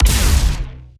Lasrgun Ballista Fire Long

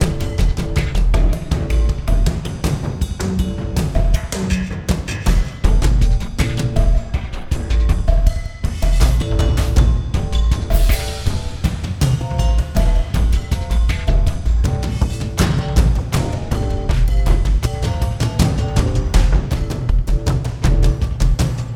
sounds music; Halo Combat Evolved; Xbox

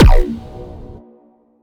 Boss Shoot

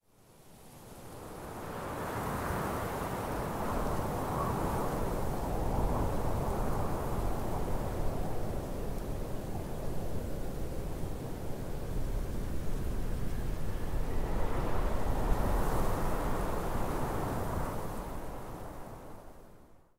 Mountain Ambience; tamarin o.s.t 2020; Eyja Mountain

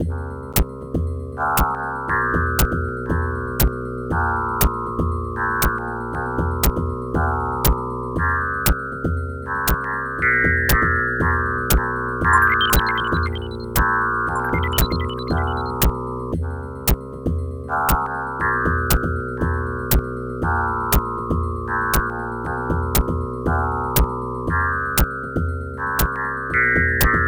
Space Funk; commander keen aliens ate my babysitter apogee software gamerip pc

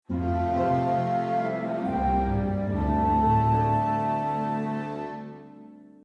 City Level Up

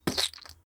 Splat

